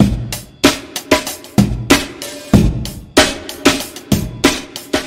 flanged, funky drum loops

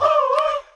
"ooh oohooh", voice; ooh ahh

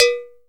cow, Bells; Misc Percussion

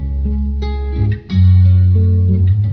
guitar easy boom bap, Jazzmatazz Loops

